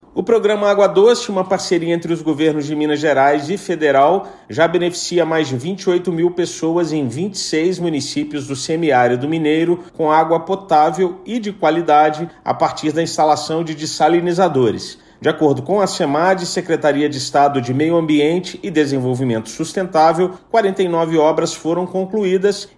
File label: RADIO PAD